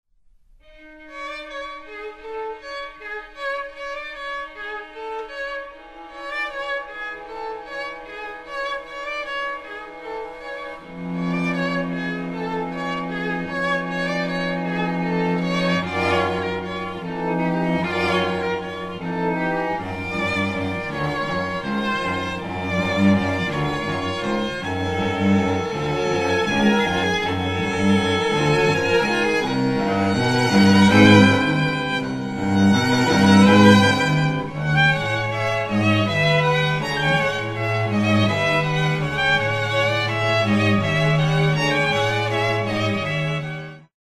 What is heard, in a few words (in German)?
String Quartett